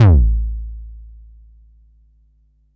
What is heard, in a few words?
dist kick; foxdot default